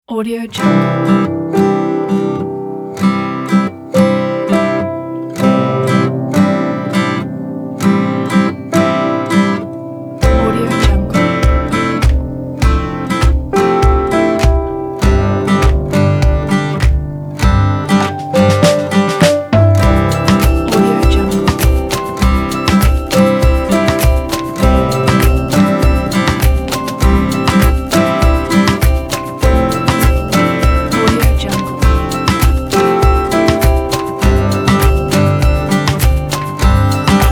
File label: acoustic guitar